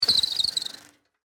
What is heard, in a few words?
Bicycle Brake, Squeal, Schwinn, Vintage; Sports; Bicycles; BlastWave